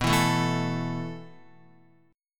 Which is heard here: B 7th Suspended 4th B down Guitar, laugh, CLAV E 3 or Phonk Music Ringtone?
B 7th Suspended 4th B down Guitar